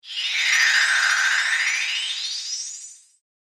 Another Door To Another Dimension, science fiction sound effect